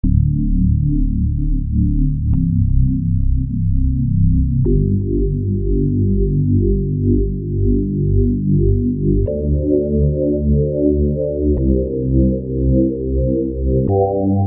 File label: Aditive Synthesis, A4000